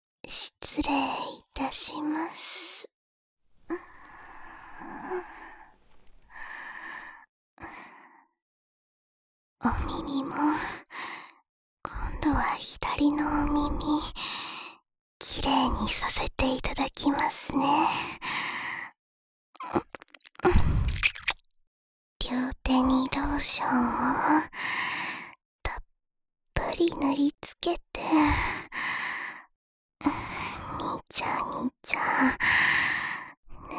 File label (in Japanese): 左耳舐め手コキ; くちゅ音無し; 左耳舐め手コキ＆おっぱいもみしだき